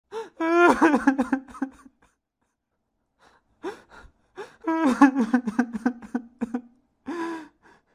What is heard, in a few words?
Male 25 years old crying